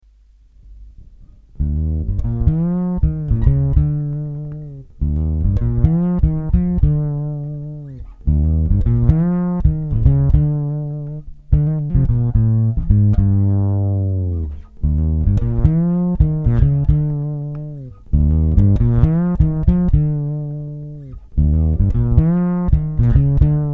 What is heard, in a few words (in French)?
actif piezo